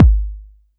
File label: BD